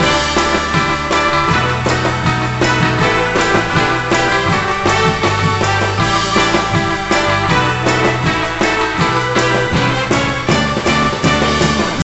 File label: Drums Chorus; monster lab; nds; Music Spirit Drums A2 Chorus